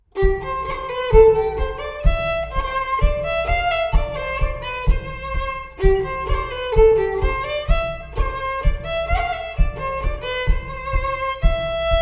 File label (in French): Bourree provencale; Vive un tambourin qui nous reveille